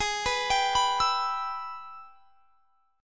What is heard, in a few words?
Ab Minor Major 7th Sharp 5th, down; arpeggiated; AbmM7#5